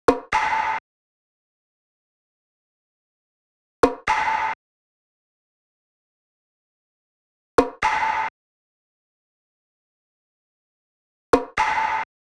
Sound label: Drum Loop - 128 BPM Percussion, Experimental; Drum Loops; Loop Stems; Cymatics - GHOST (Beta Pack); Abnormal Drum Loop